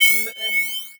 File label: Multimedia Internet CD-Rom Flash Whoosh Multiple